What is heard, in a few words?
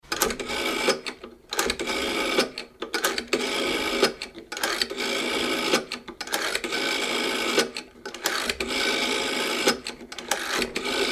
Telephone Dialing Various - Bakelite Phone 706 Model; 1940 to 1960s